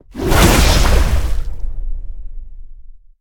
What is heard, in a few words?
wall of spears spears; odin; smite